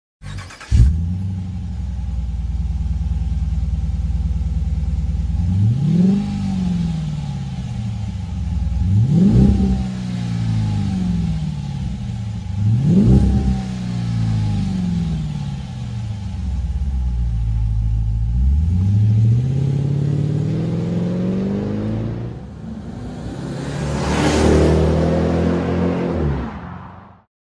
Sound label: magnaflow exhaust systems